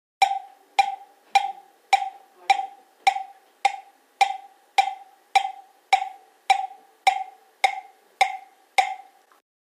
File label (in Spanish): Cencerro